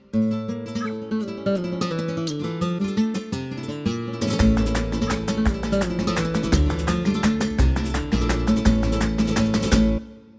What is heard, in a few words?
flamenco guitar duo flamenco